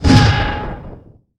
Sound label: Menu Magic; Menu FX